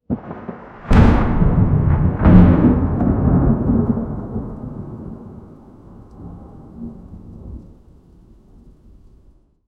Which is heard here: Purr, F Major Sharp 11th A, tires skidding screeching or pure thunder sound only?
pure thunder sound only